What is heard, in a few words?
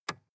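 sports car interior lightswitch soft; verkehr transport; autos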